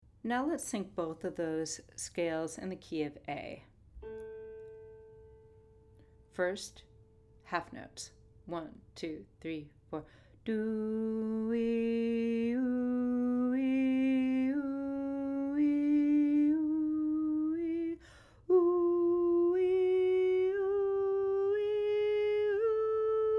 Chromatic Scale A

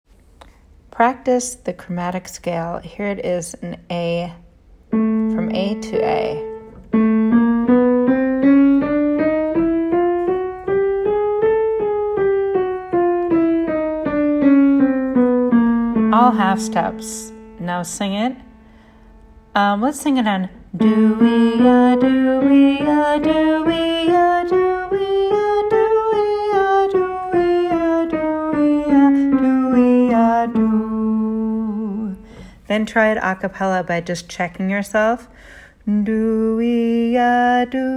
Chromatic Scales

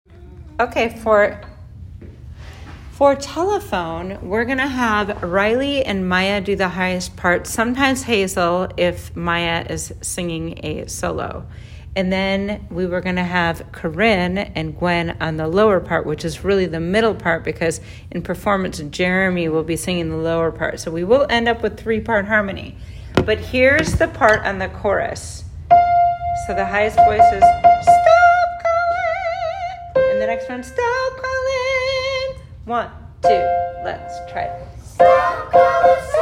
Chorus Harmonies

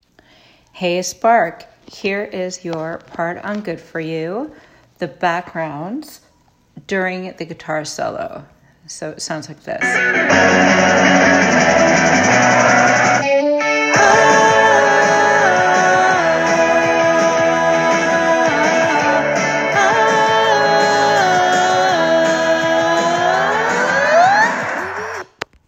bkgs Gtr solo

